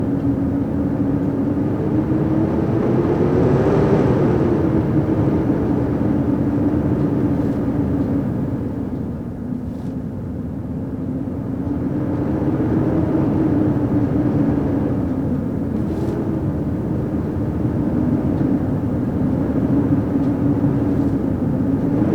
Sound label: draught wind looping from inside